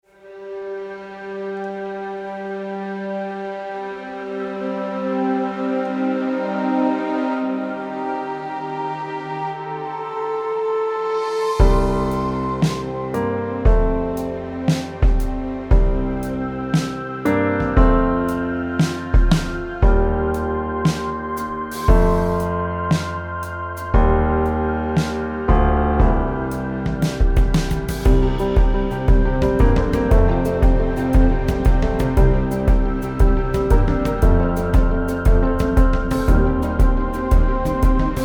Orchestral

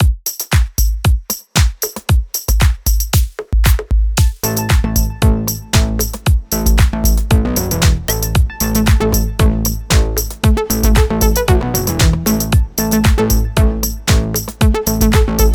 Morning Beat

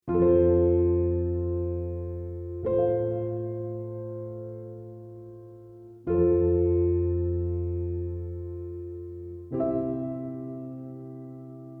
Lullabye Simple

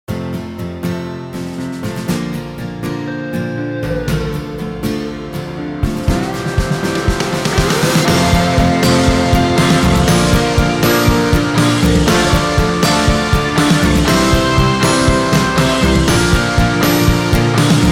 American Pride Simple

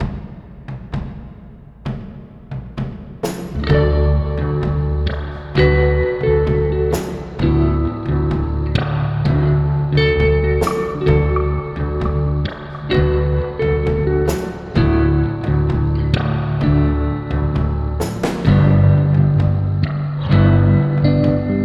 Low Voltage

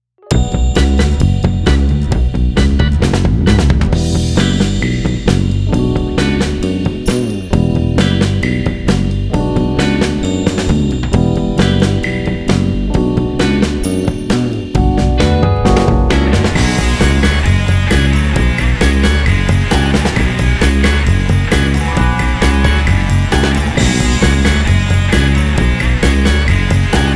Instrumental

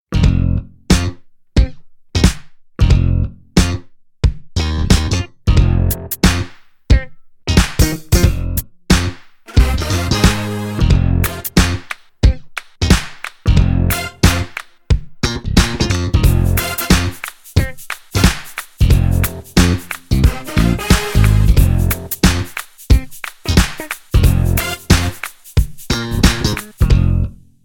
Goofball Swagger Simple

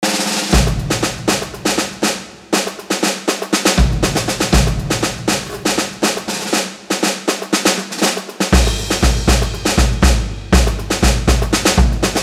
Percussion

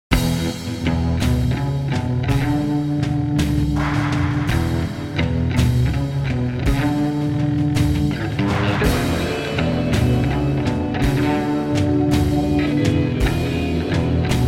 Big Riffer

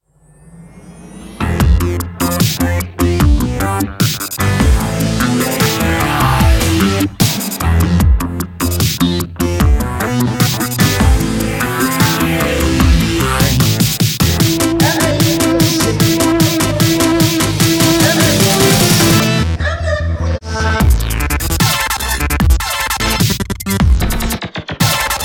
Dubstep Remix

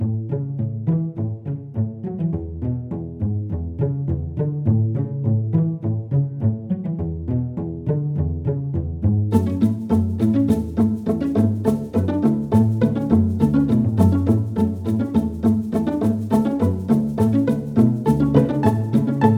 Piano

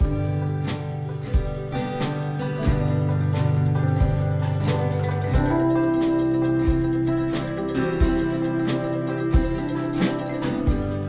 Folksy Simple

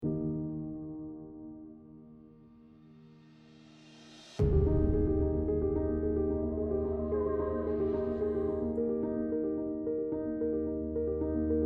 Orchestral Vocal

